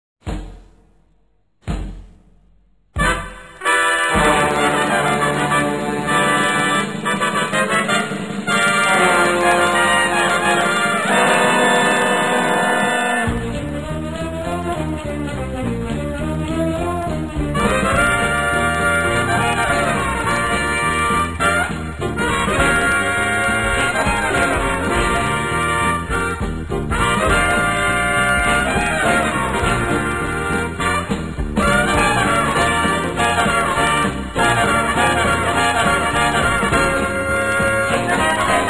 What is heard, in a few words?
trumpet blues